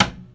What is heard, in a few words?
Foley Hi